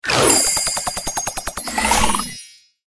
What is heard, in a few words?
Starpop